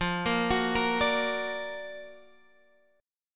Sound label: F sharp Augmented Major 7th, F